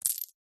household jewelry necklace silver charms place into hand; zapsplat